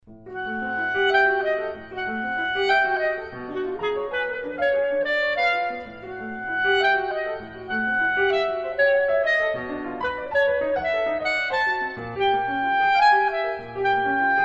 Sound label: E flat Clarinet; Partita No. 4 for Clarinets and Piano III. Dance Without Words